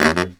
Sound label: Killer Brass Riffs, BARYT; USB Soundscan vol.29 - Killer Brass Riffs; AKAI